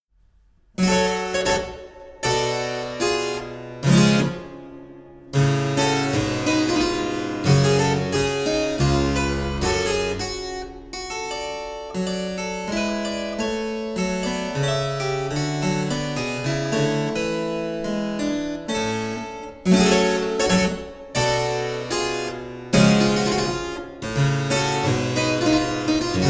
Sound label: sarabande; buxtehude